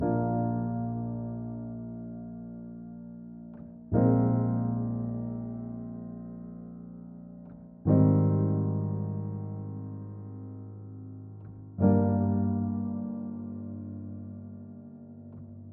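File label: 122 BPM Piano Chord Progressions Cinematic Drama D major C minor Tonic A; MMB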